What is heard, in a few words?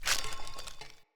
Foley Step; Enemy; Corrupted Sproutfolk